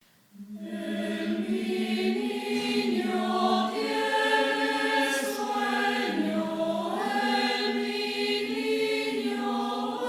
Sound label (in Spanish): El mi niño tiene sueño, Coro Polifónico; villancicos